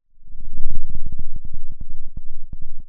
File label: pop